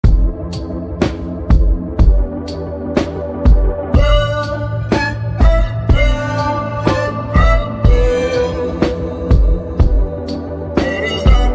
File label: instrumental